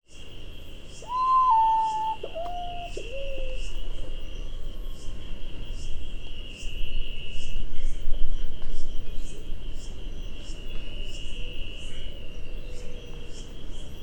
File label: nyctibius griseus